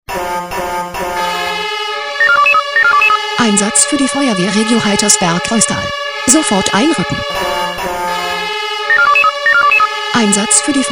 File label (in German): SMS Alarmierungstone; FW Heitersberg